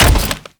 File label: Step Default, Foley; Warframe; Sentients; Erra